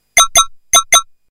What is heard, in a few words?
Message beeps